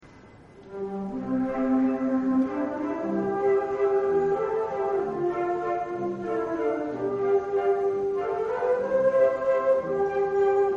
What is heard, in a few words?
concert, flute